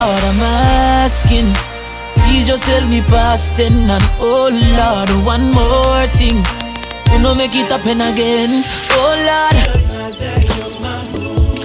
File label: Smash-hit jazz, Jazz